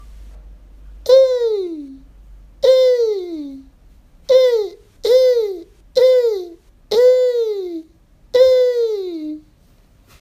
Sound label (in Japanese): イ 内転起声（きせい）